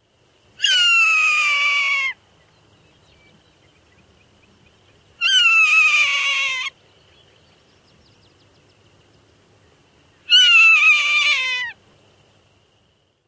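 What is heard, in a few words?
Swainson's hawk calls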